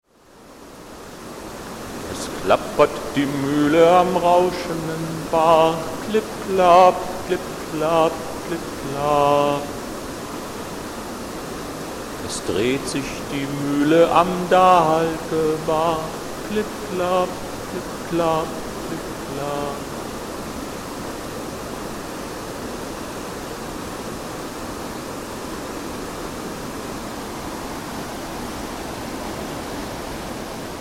O Ton Stau Wasserrad m. Gesang

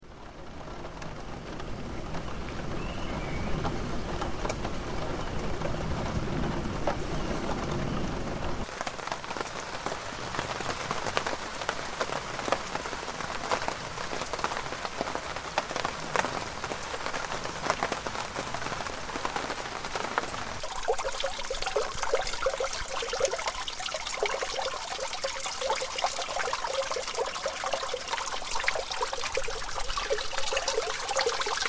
O Ton Regen und Gullieinläufe

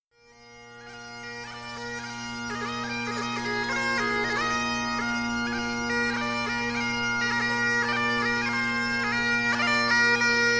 Dudelsackspiel

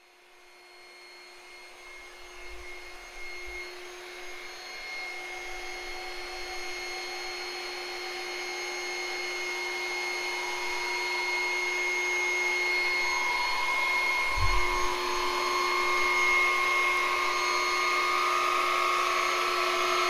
Flötenluftmatratze; Audio Ausstellungen

